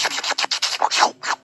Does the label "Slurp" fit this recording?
Yes